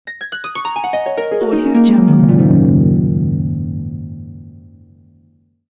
magic harp; klassikua

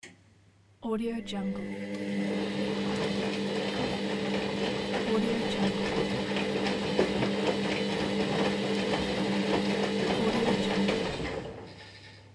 washing machine

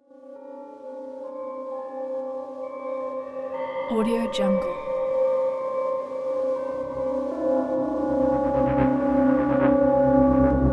mystery atmospheres

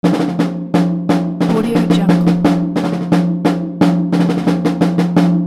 march snare loop

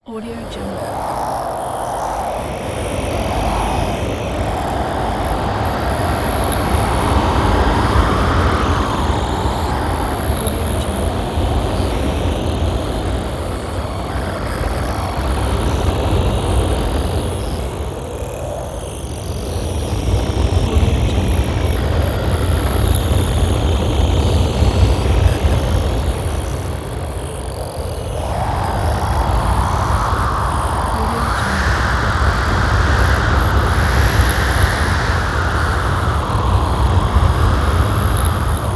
magnetic storm

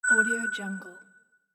space ping

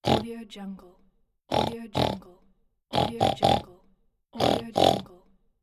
cartoon pig snort; mr-se

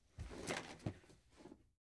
debris movement; prosoundfx

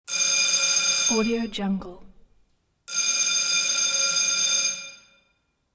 school bell ringing loudly; hollywoodedge

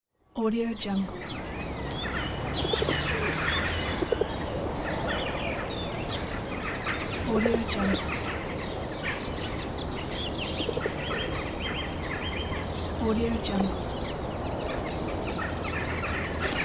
city park

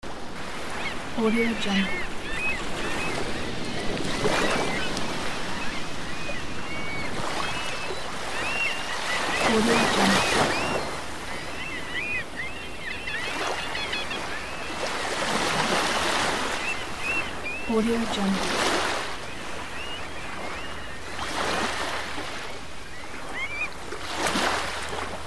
sea

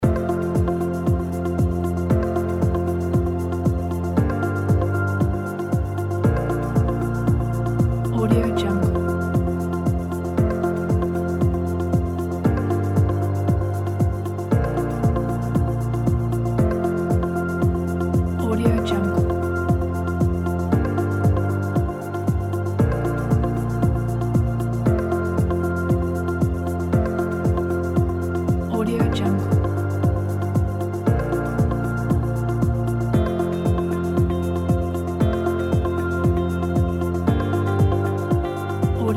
inspiring corporate loop

